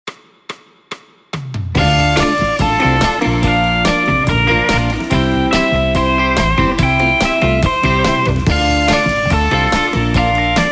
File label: Swing